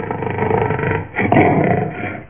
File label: Growl; Realmz 6.0.3; The Family Jewels